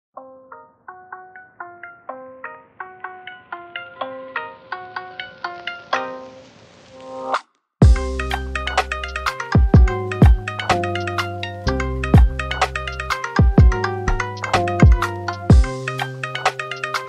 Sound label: lofi hiphop